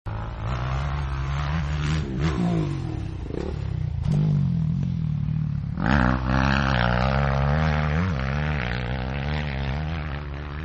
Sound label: MotoX Over Jump, Motorcycles; Vehicles